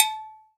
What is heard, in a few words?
JANGKOQUI, Latin Hand Perc; E-MU Producer Series Vol. 2; More Studio Essentials; Composer